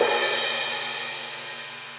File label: CRASH 18 edge crash, Cymbal; Ableton; Factory Packs; Drum Booth